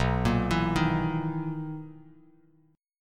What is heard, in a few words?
B Major 11th B Bass Standard; BM11; arpeggiated; JGuitar